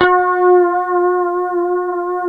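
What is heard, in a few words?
G 4 harmonic, guitar; Spectrasonics; Hans Zimmer Guitars VOL-1; 90's Sample CDs; HYPEMONICS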